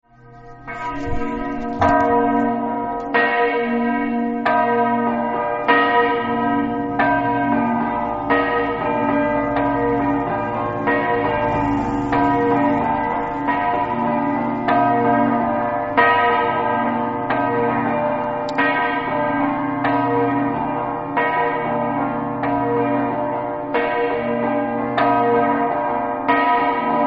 talmassons, campane

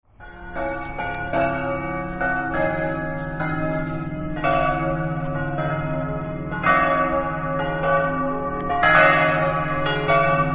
zugliano, campane

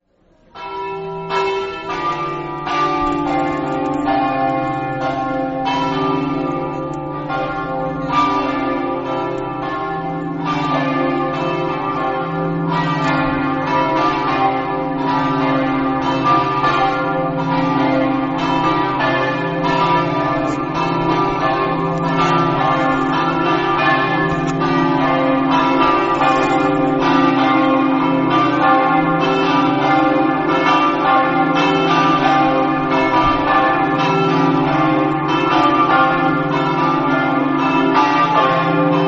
pasian, campane

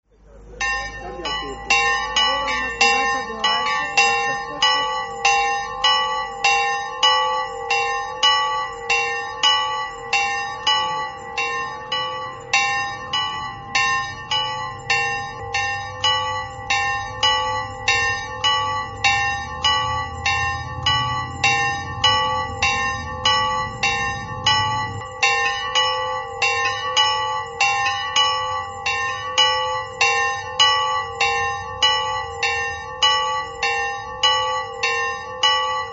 versutta, campane

